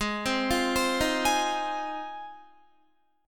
G sharp 6th, Guitar; G#6; arpeggiated; 16,15,15,17,15,15; 4E,4A,5D,5G,5C,6F

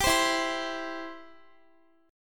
E Minor Sharp 5th, Guitar, 4E,4A,5D,5G,5C,6F; strummed; Em#5

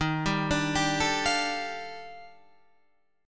Eb Major Sharp 11th Eb Guitar Standard; EbM#11; arpeggiated; 11,10,12,12,10,13; JGuitar

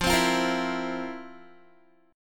F Major 7th Suspended 4th F, Guitar; strummed

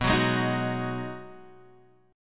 B 6th B down Guitar Standard; B6 strummed

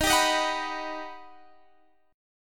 G Augmented Major 7th

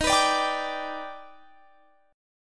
D sharp Augmented Major 7th, Guitar; x,x,x,20,23,21,21,23

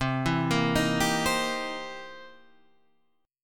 C 7th Sharp 9th, guitar; C7#9 Chord {8 7 8 8 8 8} arpeggiated

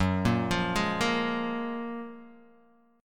F sharp Major 9th, Guitar Open D

